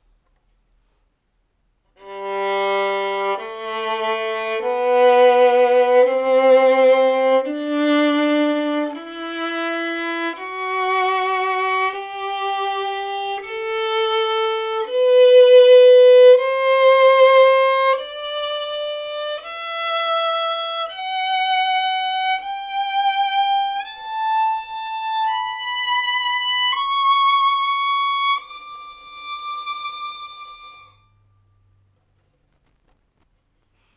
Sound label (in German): Melodie, Geigenbau; Violins; Montevecchio